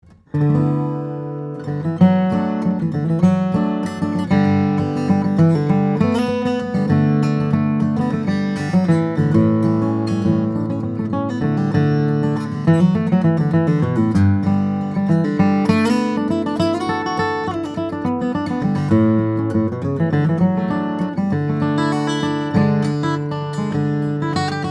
Martin Custom Shop D 18, guitars steel string flattop; sinker mahogany; adirondack spruce